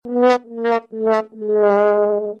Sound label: Defeat; Hellangel